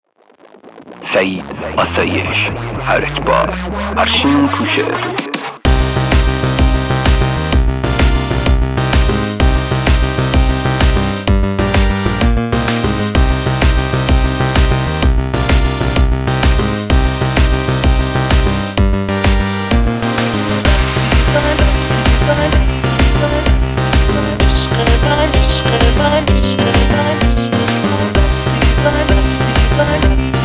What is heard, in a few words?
Guitar